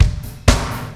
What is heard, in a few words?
strong onnections, Drum loops Boombap